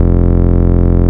Root F, Bass

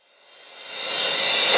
Reverse Cymbal; Vengeance Samples; VEH1 Cymbals

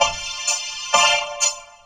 Vocoder Loops 128BPM; Vengeance Samples; VVE1 Vocal Loops

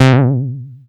Bass Juno C; Vengeance Samples

